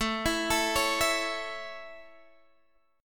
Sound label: A Major, Guitar, Half Step Down